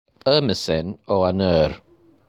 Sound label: Vomitting